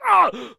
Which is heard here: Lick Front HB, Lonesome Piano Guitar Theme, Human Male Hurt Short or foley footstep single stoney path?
Human Male Hurt Short